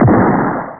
xplo short

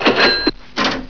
Sound for Notifications; XTimer 1.5

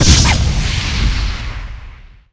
Missile; Defiance

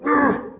vocal; WCWDEMO

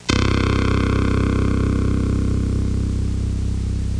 DEEP, ELECTRON; Easy Personal Web Page

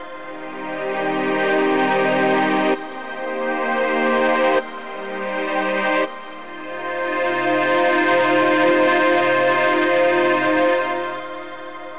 SYNTH PAD AMBNT 160 C, Ambient; eJay; Sphere

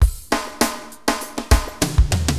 Drums, AcidJazz Funk; hiphop; LiveAct Pool 1

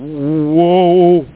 WHOOH; ARCADE; OSCAR

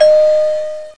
GOOD bell

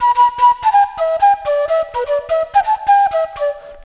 FLUTE ETHNO 125

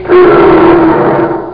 dragon lock; Crystal Caliburn

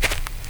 step grass; Singles Flirt Up Your Life

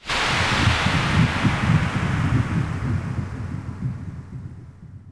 fissure bubbles; DAoC

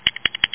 CHATTER; wincraps

